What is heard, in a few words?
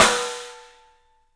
STEEL S, drums; 3D Sound